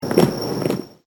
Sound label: marching